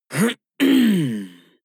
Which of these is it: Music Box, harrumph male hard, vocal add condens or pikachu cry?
harrumph male hard